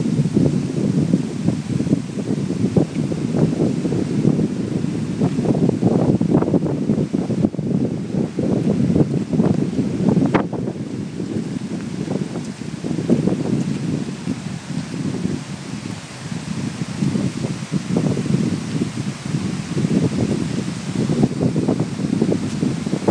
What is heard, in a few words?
wind at holkham beach